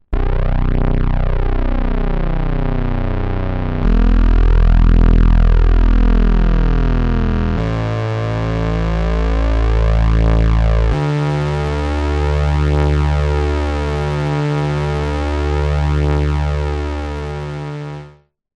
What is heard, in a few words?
BASS Pedal Synthesizer; Synthractive; syntheway